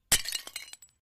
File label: Ceramic Breaks; clay & ceramic